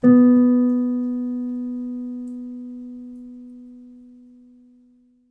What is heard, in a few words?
piano sample